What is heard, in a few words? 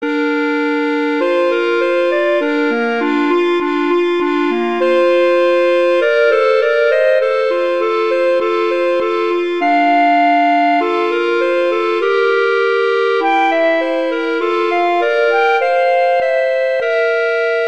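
Duet, horn; Mozart Duets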